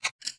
Ammo Pickup

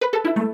Opening Pop Up

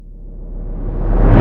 Abduction

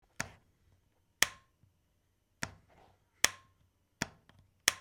Lightswitch

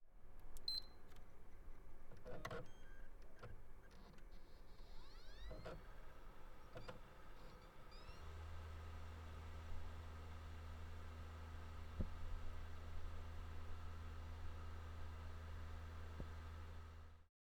Ps4 Getting Switched On

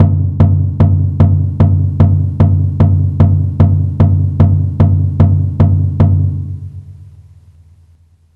Drum Beat

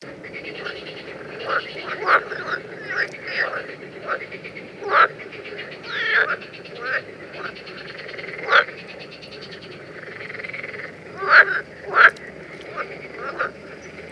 Water Frogs

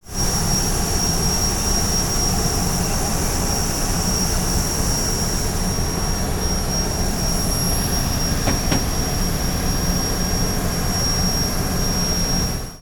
Plane Engine

